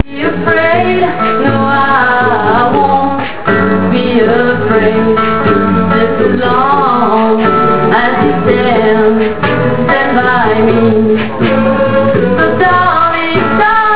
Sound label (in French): Chants de Coton